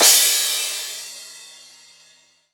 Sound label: Crash, Cymbals